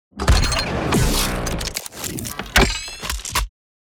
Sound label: Cybernetic transformers robot transformation